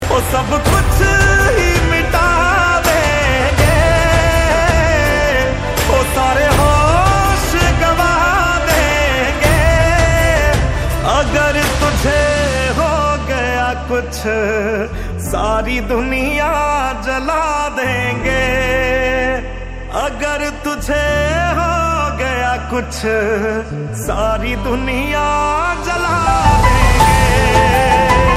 Heart Touching Song

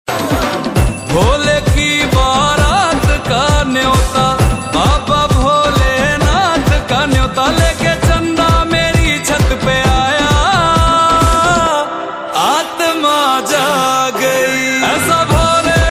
Maha Shivratri Song

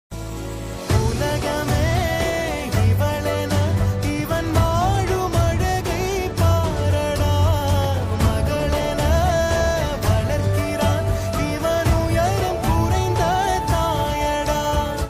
Akka thambi song tamil

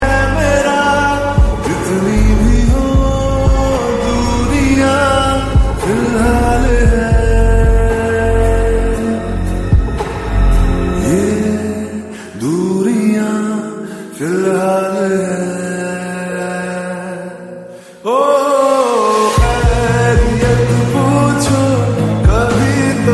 lofi slowed reverb

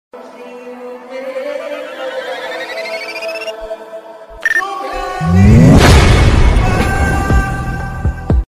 Heart Touching Song

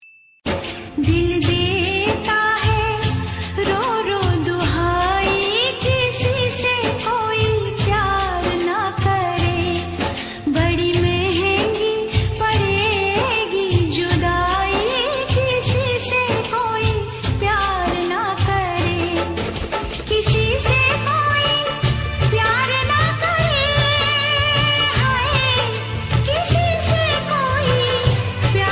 sad song

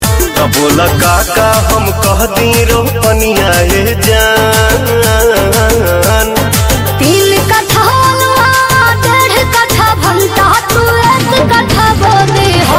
female version

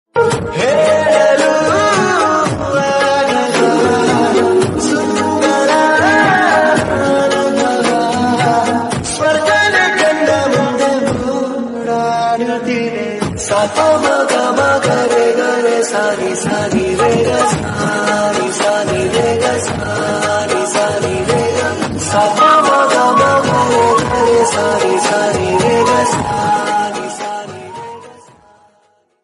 background music

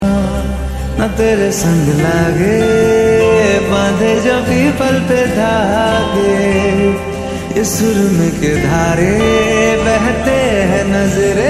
slowed reverb lofi song

